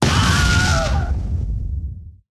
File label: Tank Taunt Death